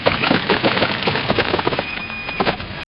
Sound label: jeep engines roaring tire